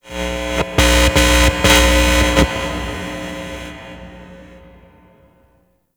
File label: Stage Amp; Warframe; Ambience; Nineteen Ninety Nine; Obj Temple Defense